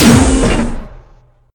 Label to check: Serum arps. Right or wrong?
Wrong